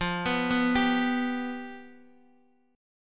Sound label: F Suspended 2nd Flat 5th, Guitar, Open D